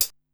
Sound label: HiHat Closed; Korg Wavestation-SR; Drum Kit